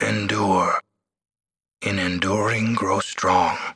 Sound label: haha; nwnds audio